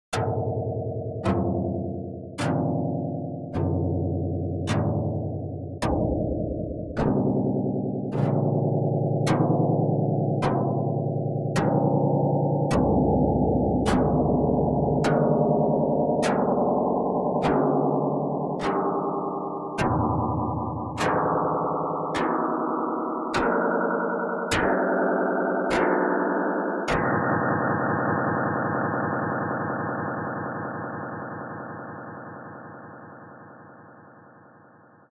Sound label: Lyre Electric